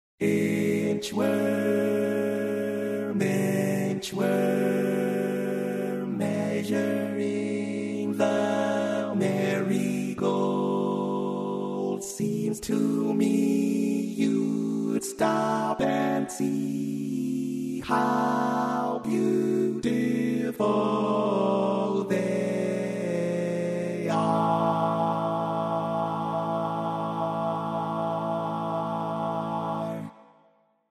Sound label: Bari, barbershop